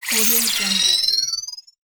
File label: scifi interface